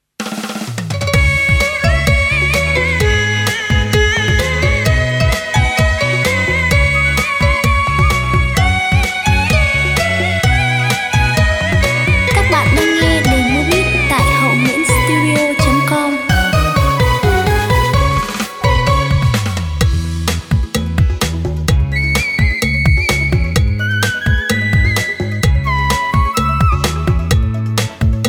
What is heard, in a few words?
Lk beat, playback